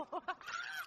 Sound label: monkey piggy